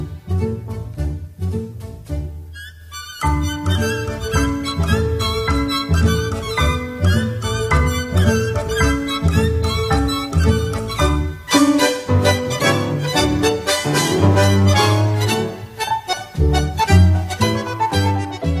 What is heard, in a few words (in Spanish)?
milonga